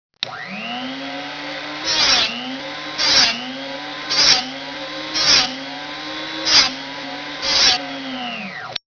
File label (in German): schleifen